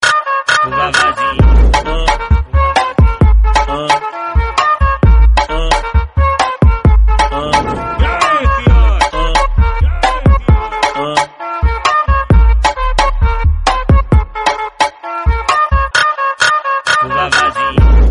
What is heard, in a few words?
Ringtone